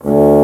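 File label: Bass Trombone; D1; Multisamples; Digital Sound Factory Virtuoso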